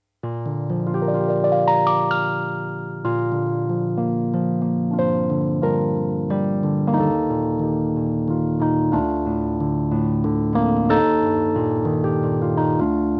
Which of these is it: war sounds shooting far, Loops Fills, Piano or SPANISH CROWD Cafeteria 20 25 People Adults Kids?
Piano